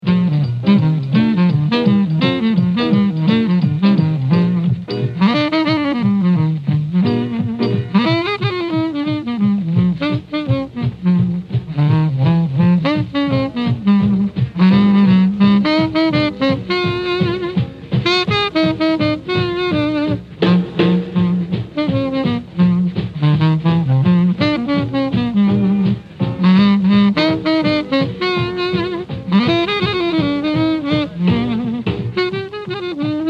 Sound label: Jingle Bells; Special Ed Xmas